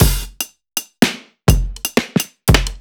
Kit Wonk, 85bpm; off the grid

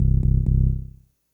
bass, Hardcore Breakbeat 129 Cmin; Big Fish Audio; Hardcore Breakbeat Action

